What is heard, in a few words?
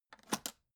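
aluminium case small open short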